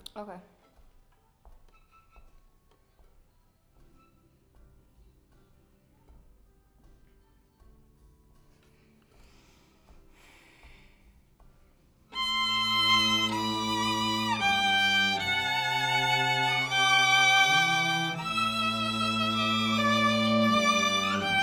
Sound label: Violin